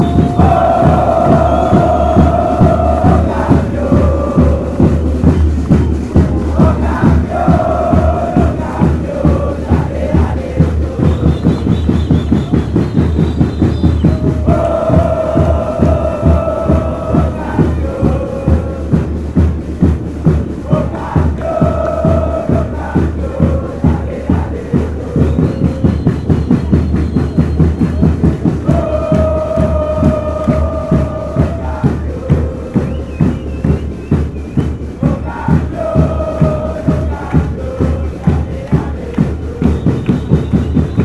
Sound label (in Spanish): deportes tolima chant